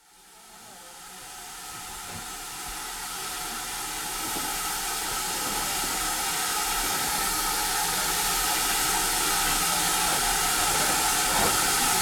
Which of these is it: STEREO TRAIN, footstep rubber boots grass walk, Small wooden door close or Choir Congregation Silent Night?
STEREO TRAIN